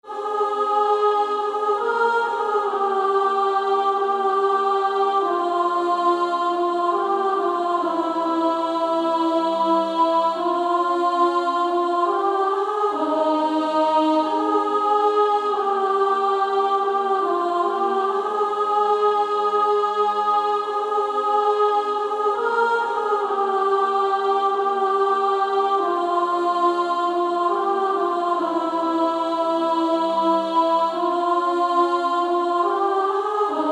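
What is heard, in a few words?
Female Choir, soprano 1; Yield Not To Temptation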